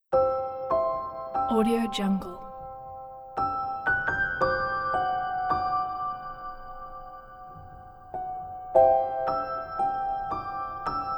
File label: magic lullaby